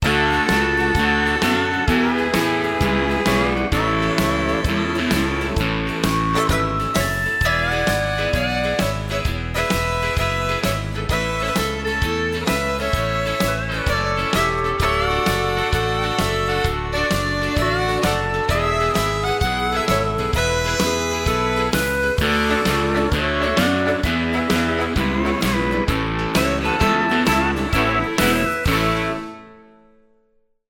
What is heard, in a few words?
Steel Swing